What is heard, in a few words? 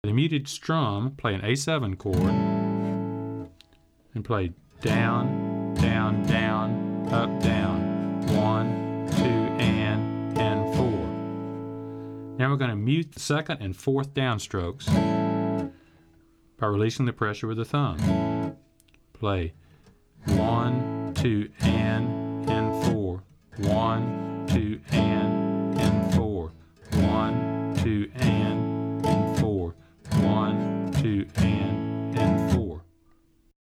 Muted Strum